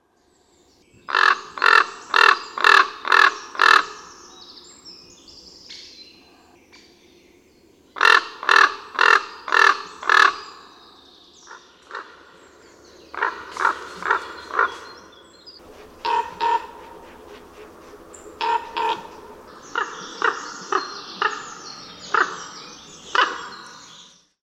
kruk

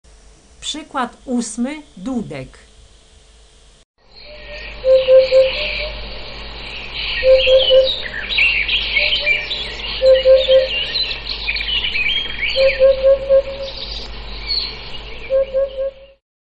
dudek, ptaki

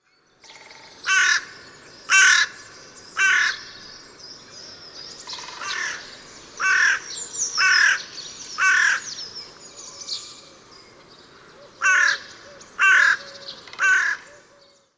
wrona, ptaki

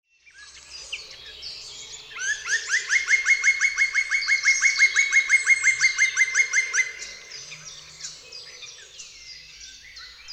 dzieciol czarny

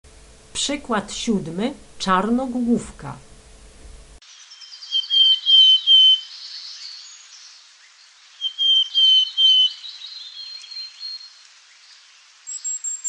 czarnogłówka

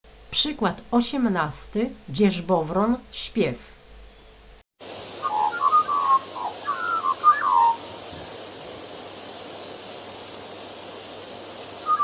dzierzbowron, ptaki

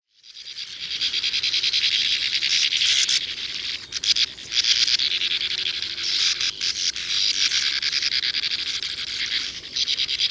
brzegówka, ptaki

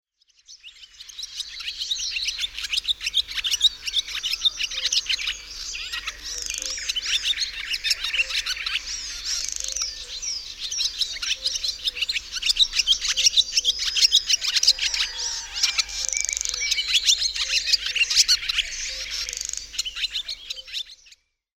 dymówka, ptaki

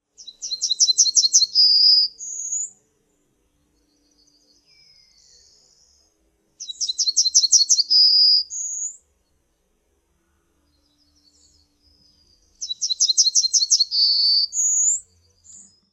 trznadel

